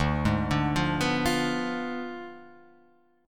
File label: D Diminished 7th, chord